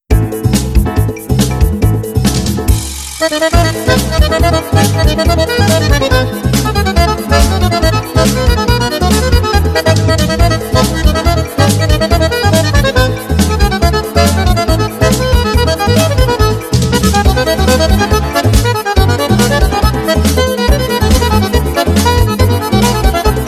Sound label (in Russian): на баяне samba accordion music